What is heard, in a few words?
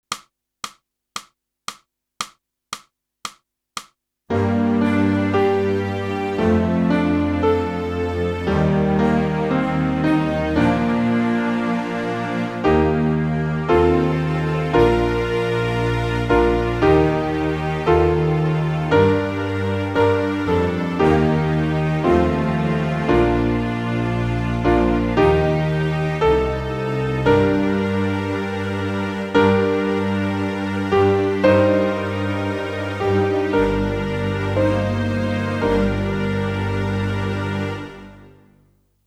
sax